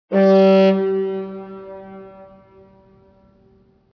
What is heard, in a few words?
ship horn